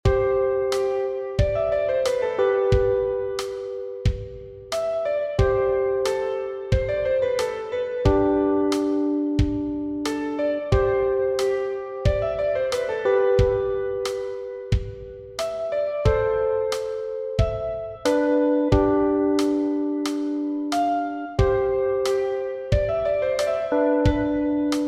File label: shopping theme hifi